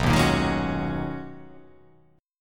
B Major 13th B down

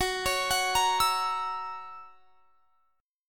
Gb 7th Gb down, chord

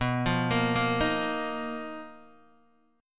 B Major 7th Flat 5th B down Guitar Open D

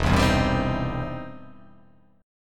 E Minor Major 7th Sharp 5th, E, down

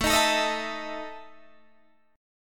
E Major 7th Suspended 4th, A

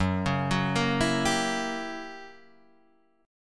Fsharp 7th Flat 9th down Guitar Open D

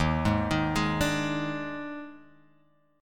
D sharp Major 7th Suspended 4th, D sharp, down; arpeggiated; D#M7sus4; JGuitar

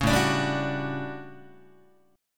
A 13th A down Guitar; strummed

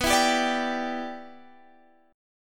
B Suspended 4th Sharp 5th Add Sharp 9th B; Bsus4#5add#9; strummed

